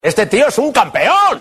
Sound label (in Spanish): tono divertido campeon; whatsapp